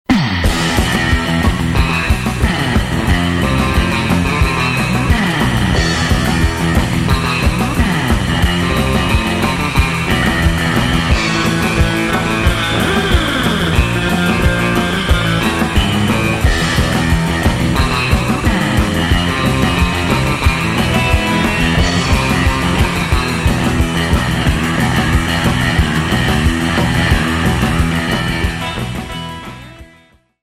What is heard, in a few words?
Reverberator